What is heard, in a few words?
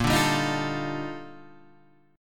Eb Major Sharp 11th Bb